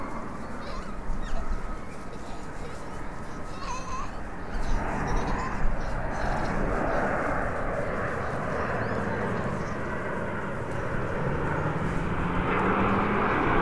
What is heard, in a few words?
air